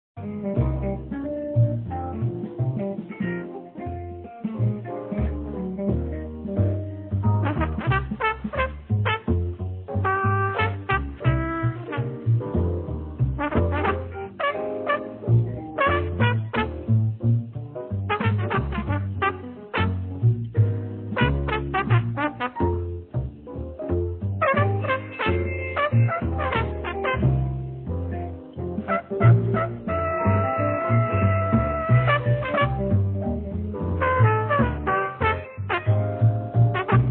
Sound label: music